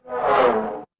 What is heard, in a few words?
feedback fail